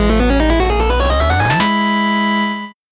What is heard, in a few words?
8bit jingle fast